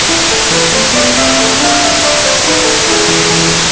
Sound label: noisy